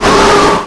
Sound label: wind; Pokemon Solar Light & Lunar Dark 1.0.1